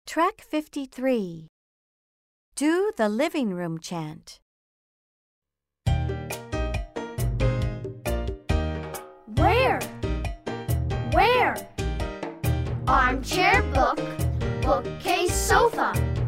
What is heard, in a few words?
the living room chant